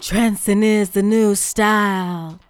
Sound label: TRANCIN IS, FEMALE VOICE; Best Service; Hallelujah